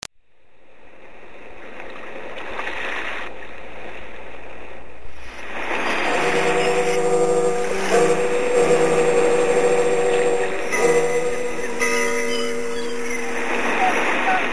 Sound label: whaler pub tune